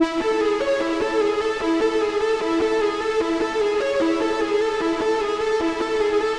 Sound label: Synth Loop BPM 150 A Min; Gully Loops; Advik; UDD Gaye Sample Pack